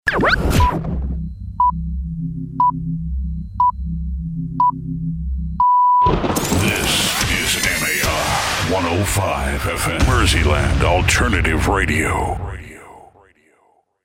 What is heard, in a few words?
pips; MAR Jingles